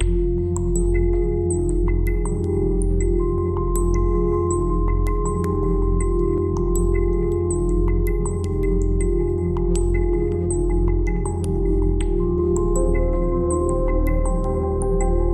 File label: Interior Music; Grav